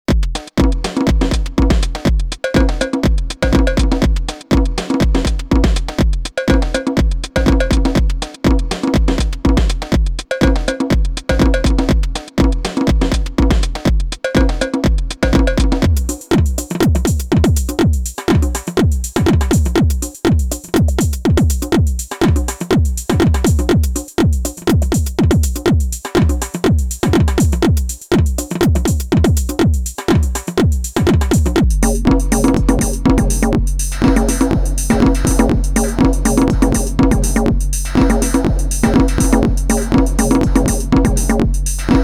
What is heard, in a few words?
JoMoX Alpha Base